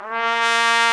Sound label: BRS, Tpt f; Roland L-CD702